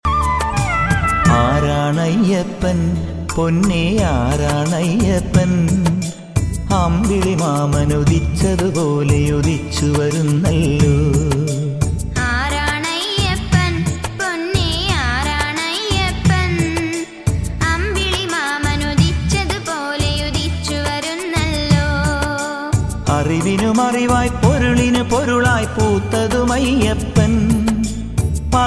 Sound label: ayyapan malayalam